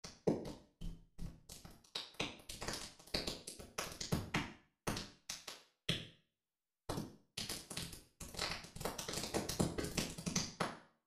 Small Room, reverbs; PCM80

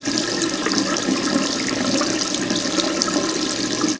sink

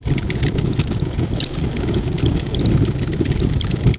fire

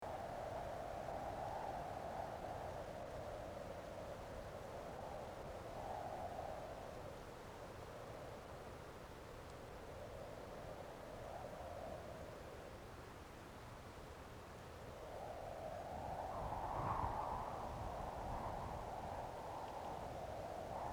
wind recording

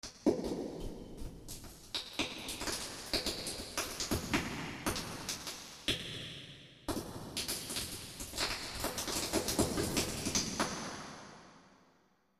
hall large, reverbs

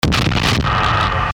DROP FIRE; ecrins; Mars

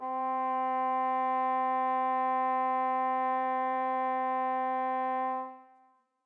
bassoon trumpet; interpolator

